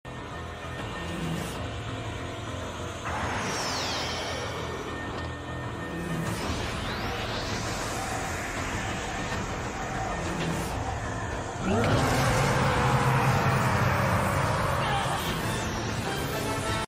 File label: Anime screams; فریاد های انیمه ای